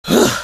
impact; episodic; episode 1; Source engine

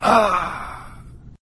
death, player

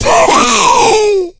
smoker death; voice; source engine; left 4 dead 2

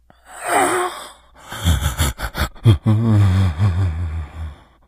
moan, infected, idle moaning

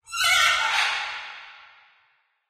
macaw; Source Engine; tf; lair

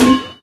phys bouncy cube

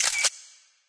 camera; Source Engine; tf

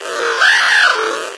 zipline; npc; source engine; episodic; combine soldier

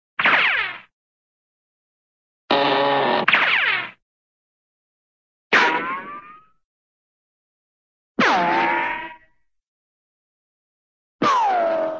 ricochet cartoon ricco

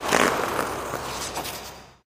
taunt skating scorcher stride; video games; source engine; tf

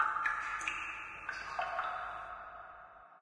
ambient water drips; source engine; portal2

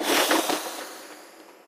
player enter jump paint

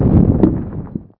muffled boulder impact hard; npc; source engine; episodic; antlion

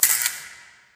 light flicker; Source Engine; Portal 2

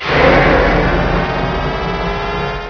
train start, plats; Source Engine; bshift

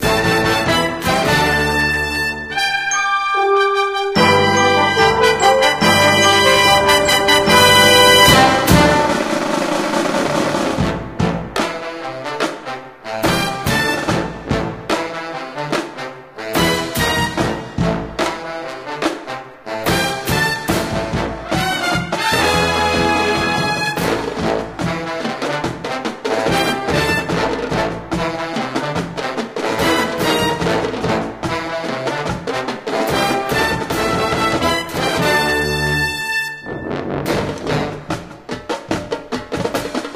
match end red win music

